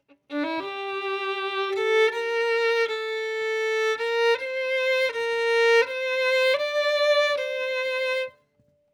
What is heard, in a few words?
Violin